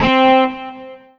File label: Korg 01W Alans Run C4